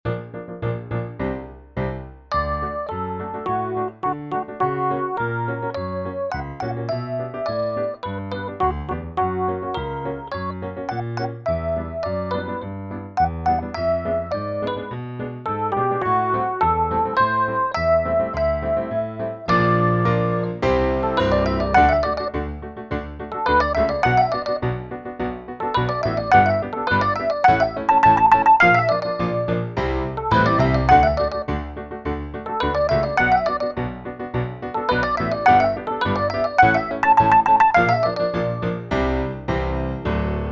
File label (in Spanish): REFE PIANO; tono 1 piano solo; el mago de oz